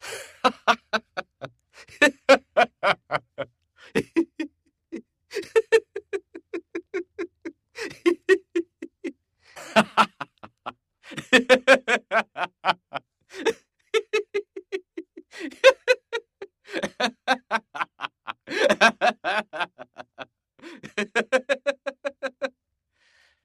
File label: Moderate Laughter